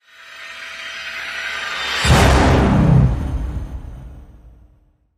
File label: Hit Orch Release The Beast C